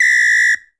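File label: Samba Whistle; Latin Percussion; Drums; Drum Hits; Ableton; Factory Packs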